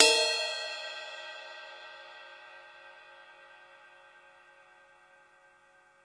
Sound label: stereo ride